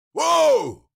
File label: Cartoon lion voice shout scream short